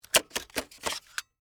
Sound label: HD Camera Remove, Hd, Camera, Remove, Battery, Sony, Hvr-Z5; Technology; Cameras